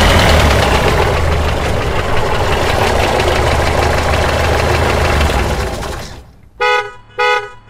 bus stop; grade3; mero bhumika

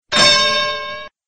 bell; grade7

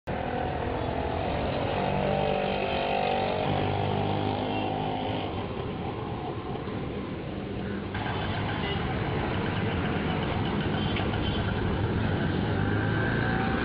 tuk tuk accelerating; dyslexia

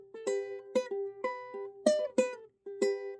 music playing; Nepali

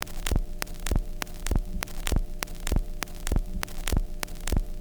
static; grade4; english; how many how much

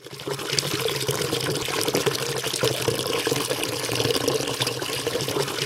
tap jug water

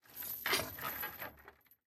paperclips drop; magnet

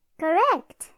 correct

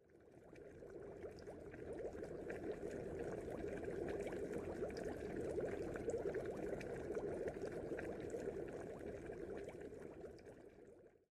boiling water

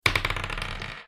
rolling sound; even and odd

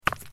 stone click

